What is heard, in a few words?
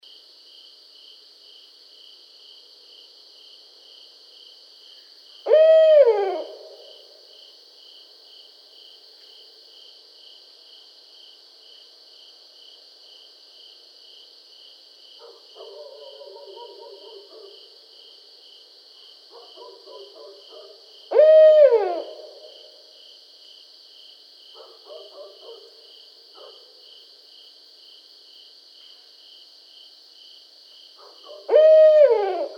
Barred Owl